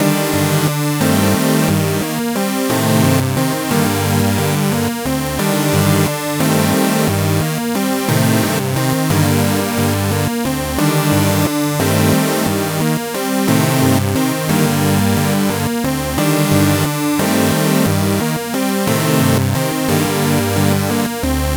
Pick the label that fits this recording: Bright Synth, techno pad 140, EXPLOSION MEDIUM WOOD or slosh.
Bright Synth